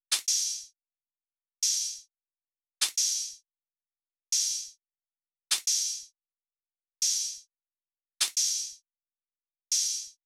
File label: Drum Loop - 89 BPM Open Hat; Loop Stems; Cymatics; Vibes II Drum Loop Collection; Thinking